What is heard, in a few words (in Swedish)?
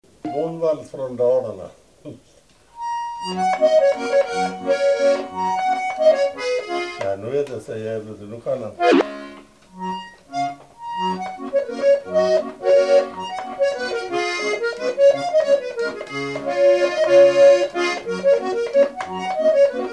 Bondvals från Dalarna